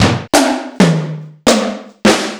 Simmons SDS2000

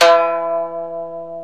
KOTO E4, Plucks; Eth Plucks, Pluck Duo

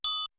TRIANGLE MUTE; East West; Global Sounds

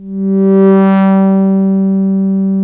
NAPPE, Analog Fantasies, KORG; USB Soundscan vol.49; AKAI

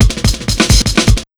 RUN 140 BRK, Big Beat Drumloops

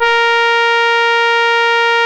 A#, Synclav FM, BRASS; Big Fish Audio

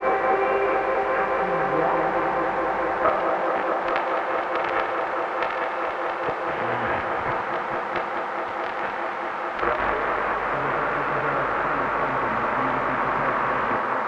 Random Delayed radio Waves

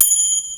Triangle, Fairlight CMI III

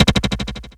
FX HIT, Techno Trance; Ueberschall

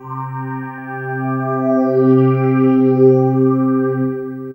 Pad; Dream Zone

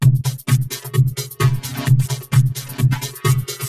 Cylon Beats (130BPM); sci-fi

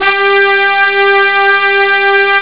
horn; jazz jackrabbit 2

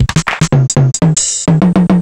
Korg PSS-50

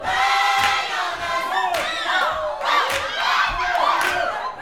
Vocal, Gospel, PHRASE; Spectrasonics

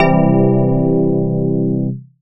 80s Bell Piano Chord; Big Fish Audio; OSH Chords

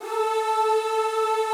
A3-L, Classical Choir; Best Service; Extended Classical Choir

